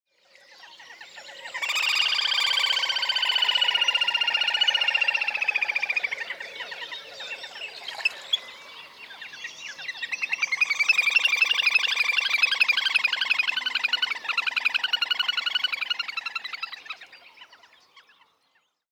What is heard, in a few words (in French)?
grebe castagneux